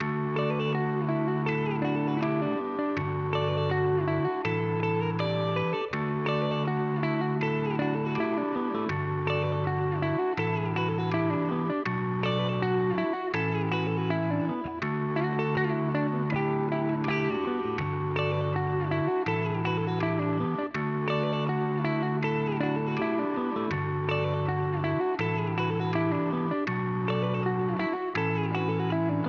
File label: Indie Tune